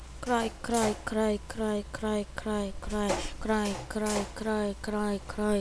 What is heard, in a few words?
cry